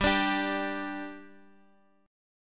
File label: G 5th G; G5 strummed